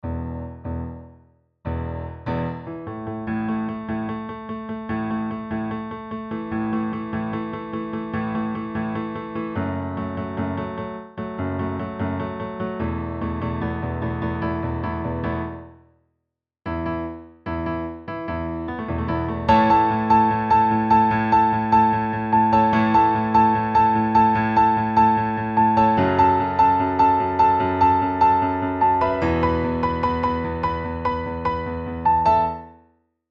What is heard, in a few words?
piano